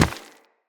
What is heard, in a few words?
Run Rock, Footsteps; Operator